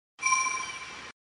sound effects save point; final fantasy viii

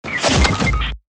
knock; fortnite

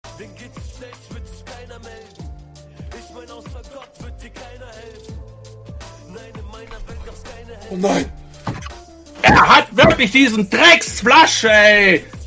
flush

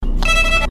screech

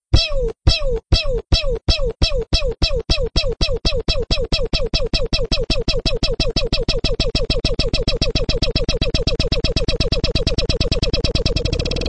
pew pew peewww super

